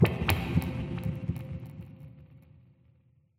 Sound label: amb abstract bubble impact, ambient; Half Life Model Pack